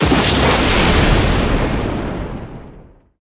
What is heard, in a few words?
effect water